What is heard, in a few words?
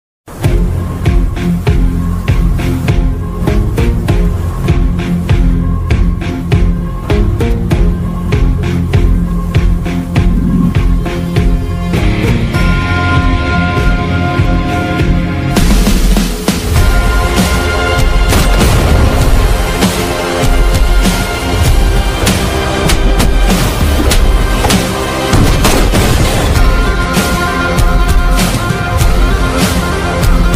tamil ringtone; captain miller